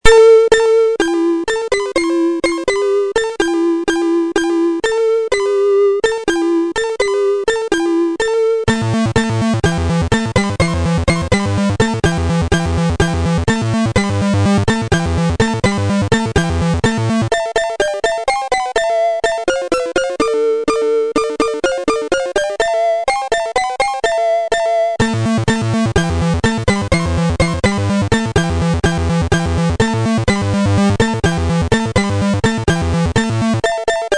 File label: Background Loop; Iron Lord